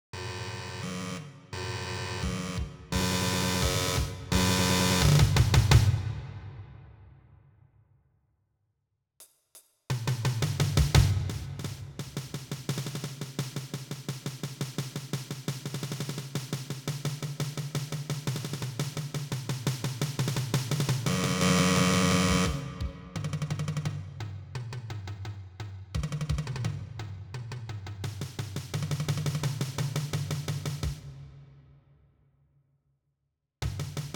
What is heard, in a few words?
Battery